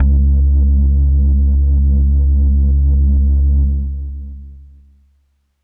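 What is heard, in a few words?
Leslie Kawaii C#3, organ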